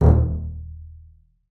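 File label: marcato D#1 left, bass spiccato; Xsample VOL-5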